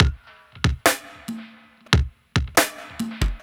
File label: Dark Ride, Synchro Funk, Beats; Sonic Foundry (Sony Creative Software)